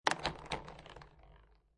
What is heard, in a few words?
Billiard Pool Snooker Billiard Ball Falls Into Pocket; Pool Sound Effects